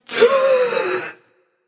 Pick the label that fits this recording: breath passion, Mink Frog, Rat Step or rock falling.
breath passion